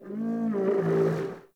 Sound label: Landscape Mid Creatures